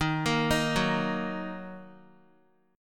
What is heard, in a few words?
Eb Minor Eb Guitar 4E 4A 5D 5G 5C 6F; Ebm arpeggiated; JGuitar